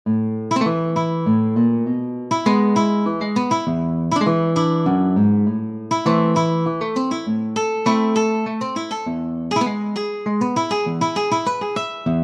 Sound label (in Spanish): zamba alegre, guitar